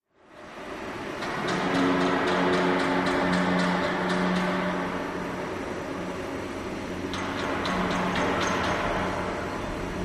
Industrial Amb Factory

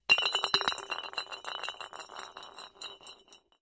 Glass Drops Rolls; bottles

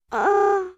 Female Robot Laughs, servos

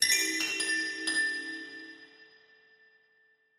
Chinese Chimes Bells

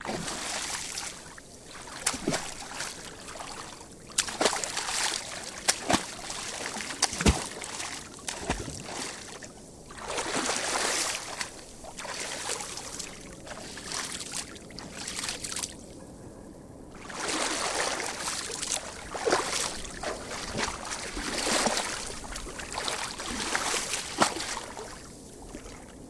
Person Walk, footsteps in water

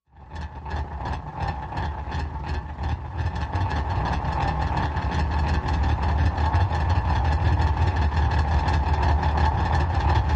Fastener Engine Machi, mechanical

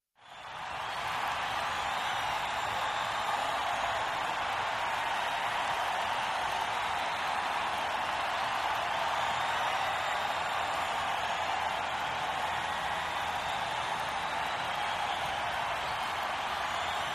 Crowd Very Large

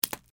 Plastic Pops, bubble-wrap

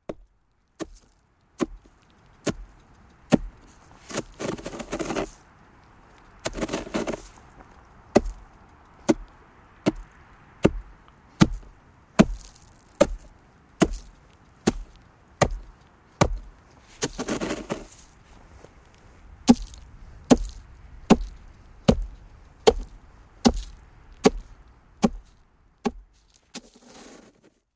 Ice Scrape Impacts On

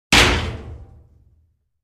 Vault Outer Door Clos; safes & vaults

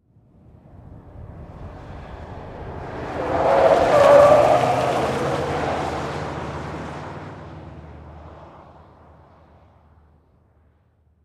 Expedition Fast Corn, ford expedition

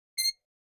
Metal Scrape On Metal

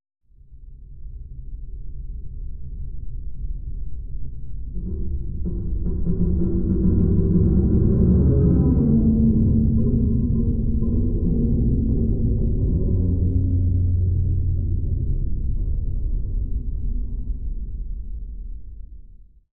Underwater Int Low Me, metal groan atmospheres

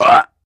Belch Shrt; belching

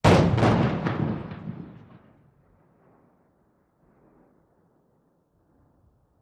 30 Feet Primacord

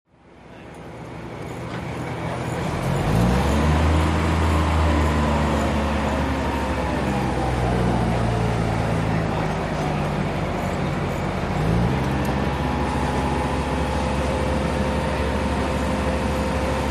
Hydroplane Dock cra

